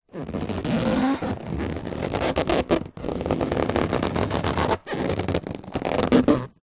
balloon inflated handling squeaks; foley

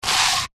stone small scrape on concrete paving slab; zapsplat; foley

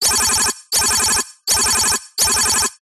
science fiction computer data burst

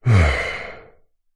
human breathe out relief; zapsplat

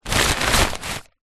foley paper pile loose movement

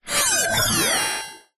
science fiction machine or robot glitch

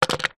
sport cricket bat set down concrete; zapsplat

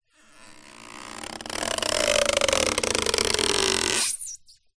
office plastic squeak stretch adhesive tape

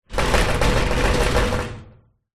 industrial metal cabinet door impact shake rattle; zapsplat

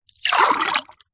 food drink water pour into glass fast

